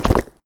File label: Concrete Footstep